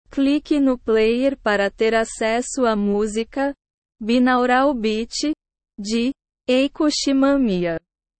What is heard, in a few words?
binaural beat